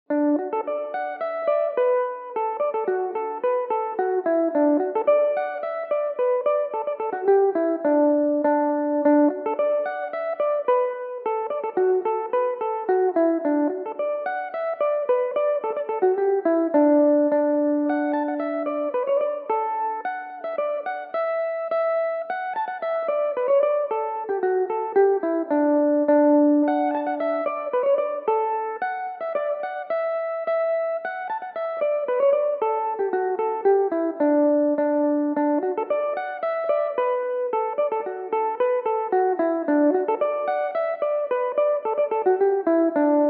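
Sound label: polka, D major, mandolin; The Ballygow Polka; Belmuse EM-190 electric mandolin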